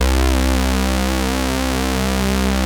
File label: POLY SYNTH; Wizoo - Powered Wave